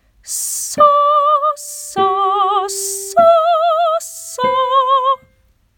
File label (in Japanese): Sa で レ ソ ミ ド; コンコーネ